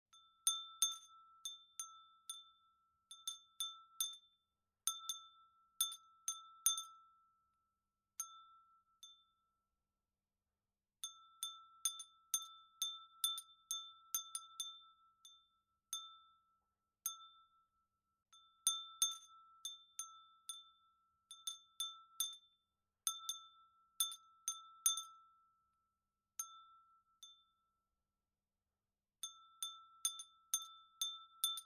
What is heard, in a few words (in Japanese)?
ガラスの風鈴のみ, ASMR